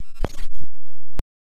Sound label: Static Burst